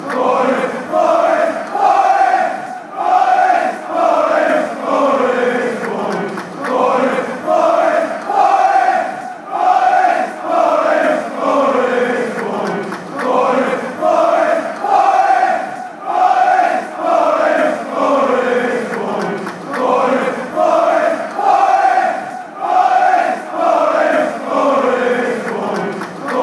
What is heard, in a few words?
forest forest forest